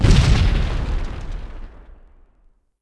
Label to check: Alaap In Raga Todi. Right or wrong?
Wrong